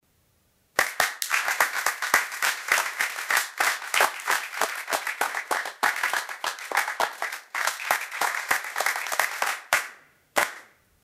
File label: claps with a magical; 2 claps with a magical